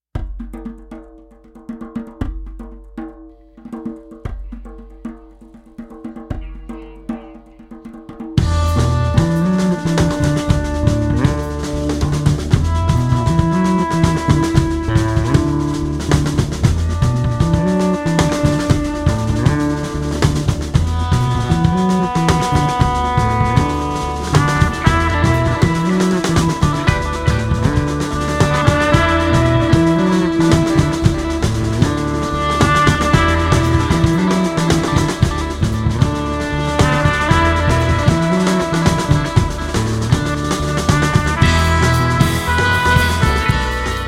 Instrumental

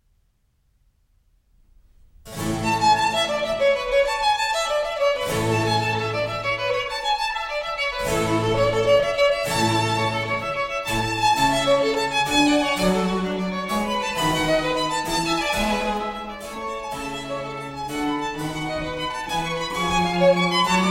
Trio Sonata in A flat for 2 Violins and Continuo

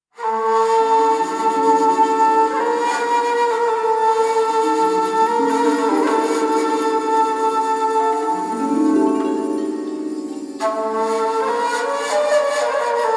Oriental Magical Flute and Harp

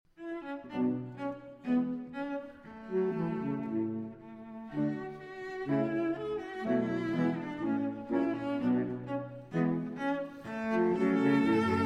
Cello, Piano & 4 Sax; Tchaikovsky Variations on a Rococo Theme, Op. 33 (Arr. Malzew for Cello, Piano & 4 Saxophones)

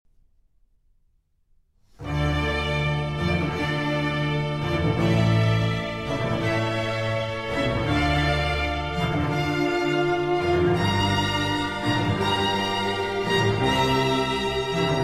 Concerto For Strings And Continuo In D Minor

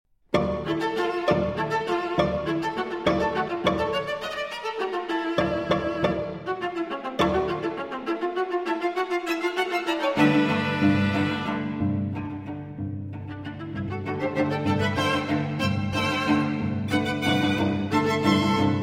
Pieces for String Quartet, Alla Valse; Schulhoff 5 Pieces For String Quartet - No. 1, Alla Valse Viennese

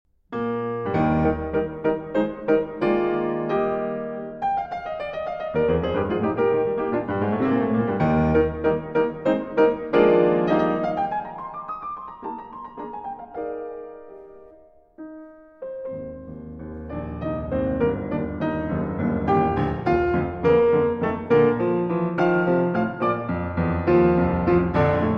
Piano Variations in F Major

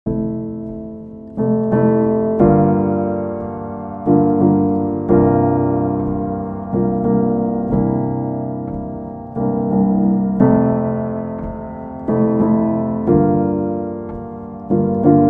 Warmth (Solo Piano)

